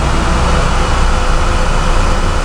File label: drive, vehicle; liaz5256